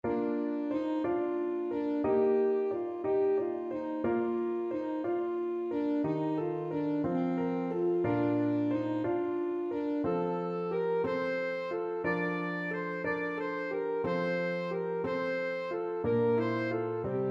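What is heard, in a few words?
alto saxophone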